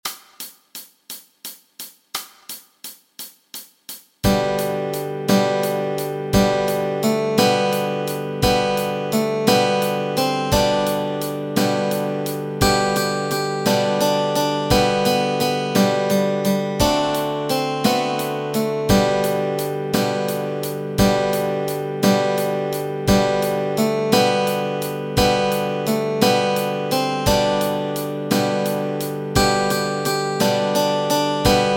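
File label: Row Row Row Your Boat melody and chords, Guitar and Ukulele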